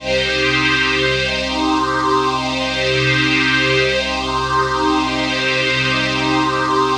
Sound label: PHASE PAD, ATMO PADS; Best Service; XX Large